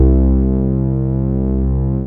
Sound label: rogue bass; Rubber Chicken Software Sample Bank